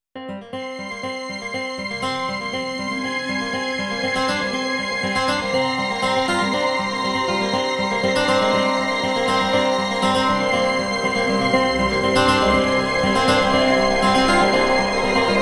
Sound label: String Cine; undead overlord linux macos windows gamerip 2014